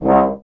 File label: tuba; Miroslav Vitous - Solo Instruments VOL-2